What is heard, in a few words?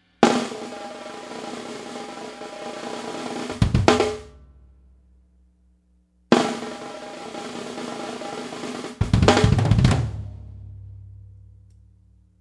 drum off